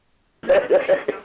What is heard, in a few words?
laugh